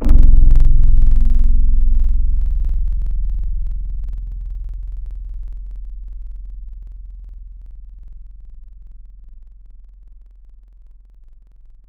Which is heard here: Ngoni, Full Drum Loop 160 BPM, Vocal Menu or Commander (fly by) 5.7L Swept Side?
Ngoni